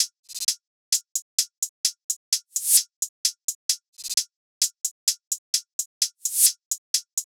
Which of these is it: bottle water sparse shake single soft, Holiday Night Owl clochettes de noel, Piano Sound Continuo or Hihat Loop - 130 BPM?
Hihat Loop - 130 BPM